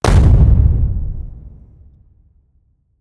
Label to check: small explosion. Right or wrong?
Right